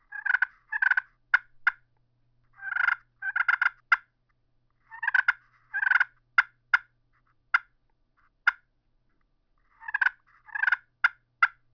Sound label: waterproof yellow heart with closer clucks n purrs, turkey; Grand Slam